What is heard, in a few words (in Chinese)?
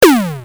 卡通, PPT音效